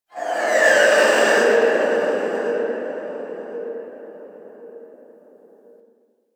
Stadium Infested Medium

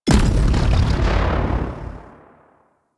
Fan Shrapnel Projectile Burst; Warframe; Warframes; Protea

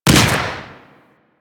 Projectile Explosion; Warframe; Sentients; Brachiolyst; Abilities

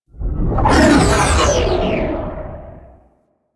Sentient Ship Close Short Fly By; Warframe; Enemy Ships; Space Fighter Sentient Coil Agent

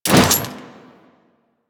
Quill Dart Gun Mode Switch Fire, Weapons; Warframe

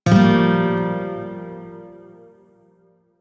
Shawzin Phrygian Chord J; Music; Warframe

